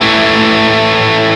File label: arp. A; osu